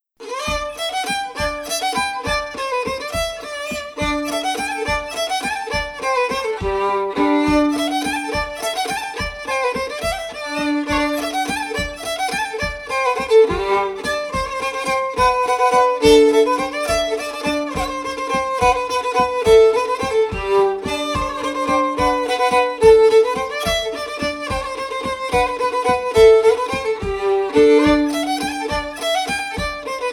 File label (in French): Bourree d Auvergne, piano